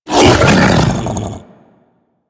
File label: Feral Vocal Tackle; Warframe; Kubrow